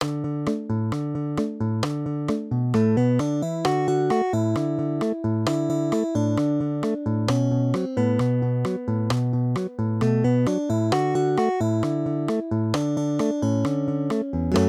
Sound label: duet, guitar